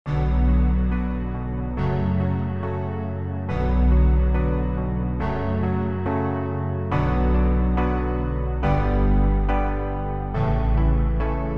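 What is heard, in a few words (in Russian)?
пафосный и трагичный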